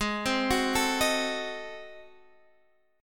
G sharp 7th Flat 9th G sharp, down, Guitar, Drop D